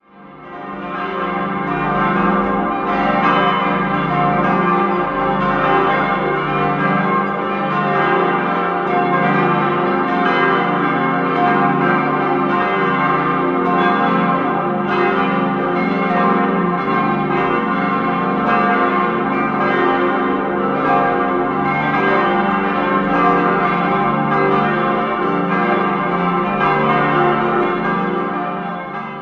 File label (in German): Neumarkt Johannes, Glocken